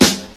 short steel snare drum sound g key, Royality free snare drum tuned to the G note.; snare drum samples